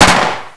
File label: shoot, paintball